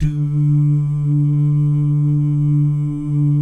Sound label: "DOO" GUYS, Vocal Jazz Fx